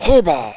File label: jump